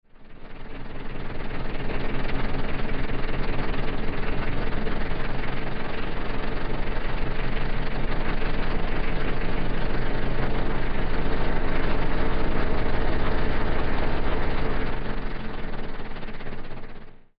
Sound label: L300 diesel engine; Claw-doo Accordian gamelan